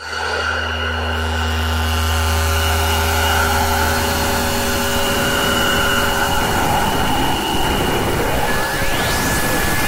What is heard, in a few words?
jumpscare